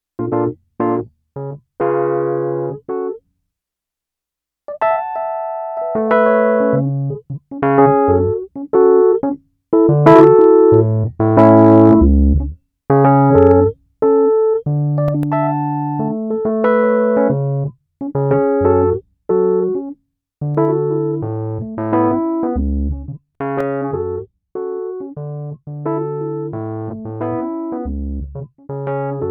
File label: Keyz R